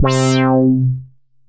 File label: Bass, Synth Lead; Brain Freeze; Ableton; Creative Extensions